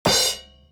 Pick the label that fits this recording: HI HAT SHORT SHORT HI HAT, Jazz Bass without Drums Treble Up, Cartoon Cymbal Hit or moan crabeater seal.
Cartoon Cymbal Hit